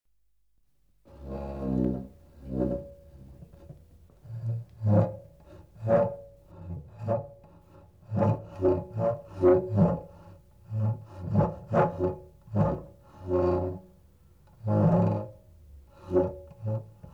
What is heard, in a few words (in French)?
Chaise sur le sol